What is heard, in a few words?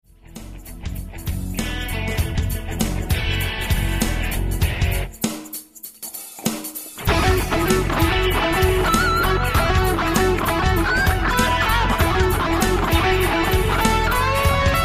jam rock guitar jams